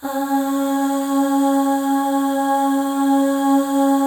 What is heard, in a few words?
AH FLAIR, FEM; Spectrasonics; FEM.2 AHS